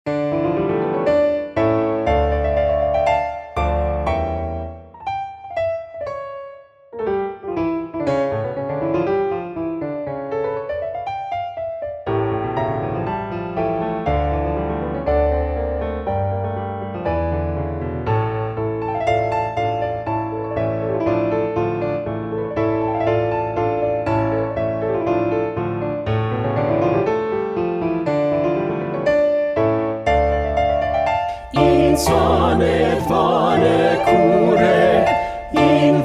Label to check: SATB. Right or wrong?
Right